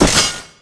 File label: Drop Armor Plate; Defenses; Dungeon Defender; DunDef